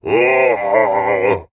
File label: Dino Groan